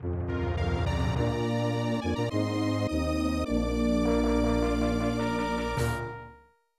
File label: Good News Jingle, snes; yamato takeru 1995